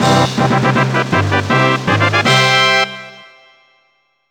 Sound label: Fanfare; Nintendo 64; Banjo-Kazooie